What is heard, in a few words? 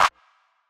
CLAP